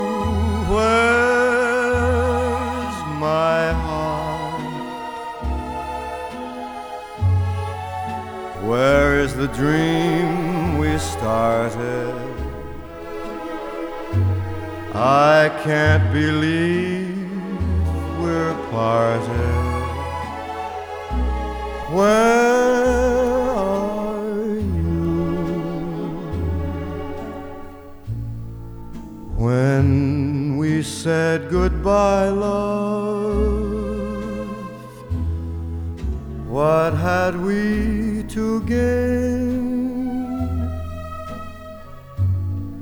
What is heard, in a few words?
mono CD LP alternating Each Vocal Phrase; MFSL